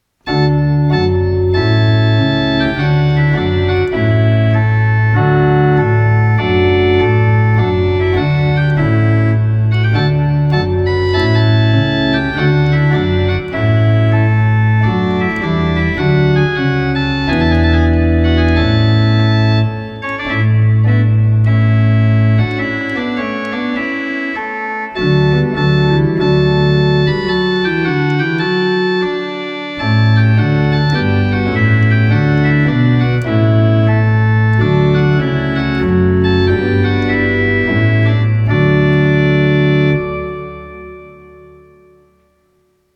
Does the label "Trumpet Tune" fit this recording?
Yes